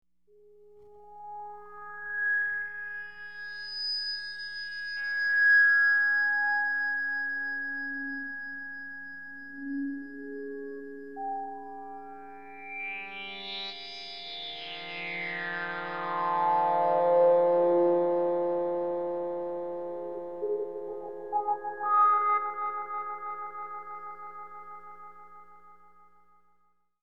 jelly drone sweeps

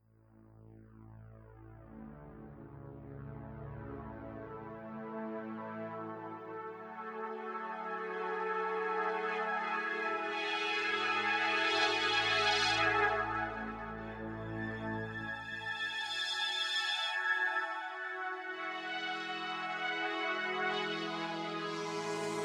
drone a; chromaris

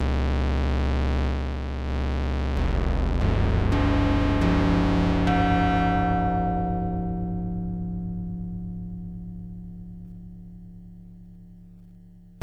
clang noise

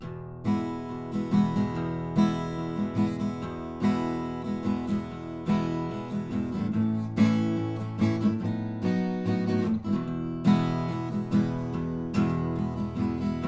strum E 72

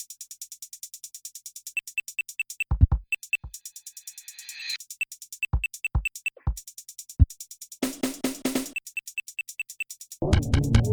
perc beat; chromaris

